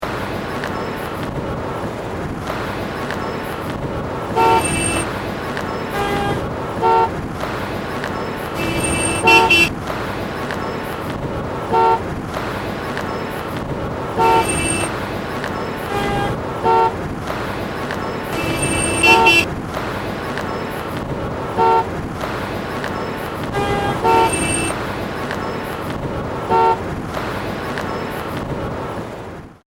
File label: walk